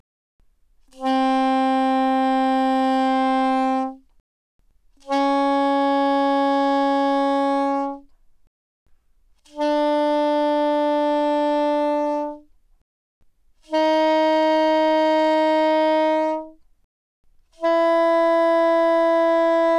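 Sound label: Soprano Sax No Vibrato mf C4 B4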